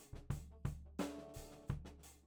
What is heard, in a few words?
Floor